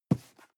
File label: cardboard box medium grab slide hard; objekte